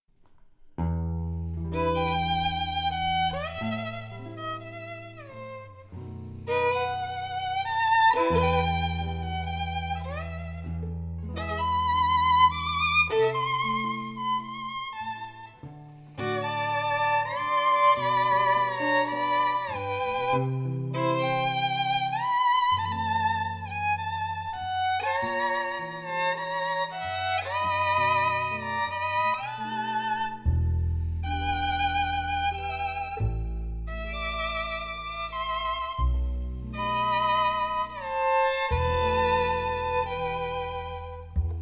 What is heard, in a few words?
Tape Gypsy jazz, tape reel to reel